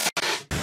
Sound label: Estinguisher